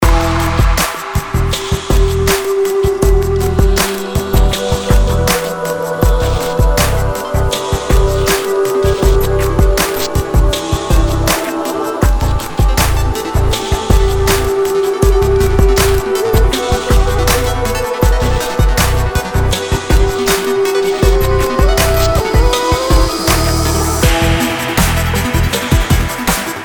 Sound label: Samsung Galaxy M52 5G Phone Ringtone